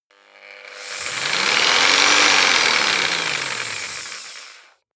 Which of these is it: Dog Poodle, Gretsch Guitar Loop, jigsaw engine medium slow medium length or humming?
jigsaw engine medium slow medium length